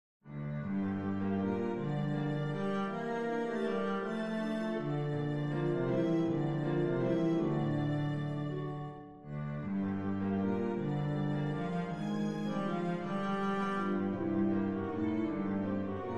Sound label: sicilienne, cello